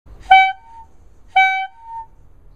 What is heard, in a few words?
14inch straight narrow squeeze horn